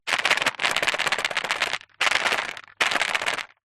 household jewelry earings new on card several shake